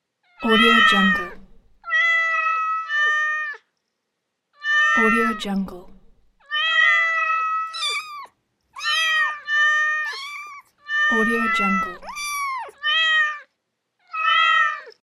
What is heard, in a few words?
lots of cats meowing